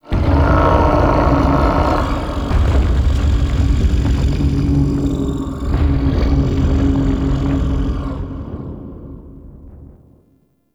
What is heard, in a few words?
Shaking Lab Rumble; Ambience; Gameplay; Warframe; Entrati